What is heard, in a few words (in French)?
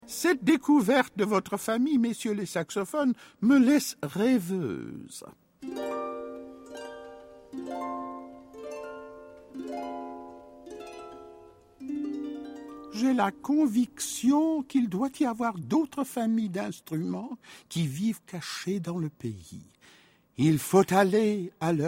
fin saxos; piccolo saxo